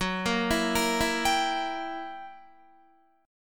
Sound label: Gb 7th Flat 9th Gb Guitar; Listen to Gb7b9 arpeggiated